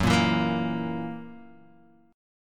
F sharp 7th, Guitar, Open D; 4,1,2,4,1,x

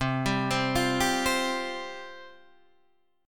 C Suspended 4th C, Guitar, Half Step Down; Csus4

